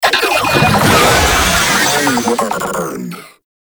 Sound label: Attack, Vocals; Warframe; Proto Infested; Babau